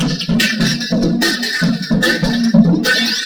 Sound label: VFX FX Loops 147BPM